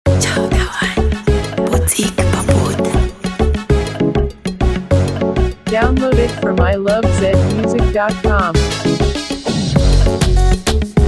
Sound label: Free Beat